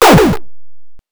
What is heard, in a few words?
randomize, 8 bits